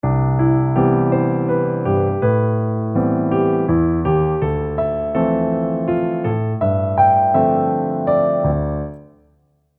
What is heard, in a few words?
2 hands